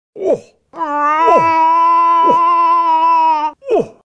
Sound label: Cow & Bull Mating